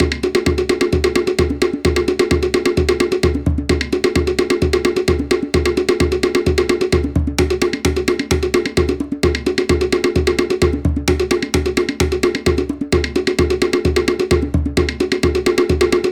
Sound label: OTEA TITIRAINA ALL IN ONE SLOW, toere